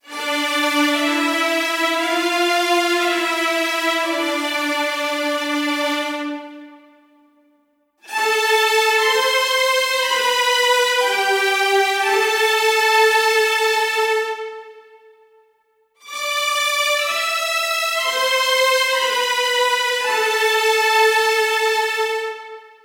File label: Violins Counter Melody - Am 120Bpm; Construction Kits; STEMS; Ghosthack; SkyRealm; Kit 21 - Mystical Journey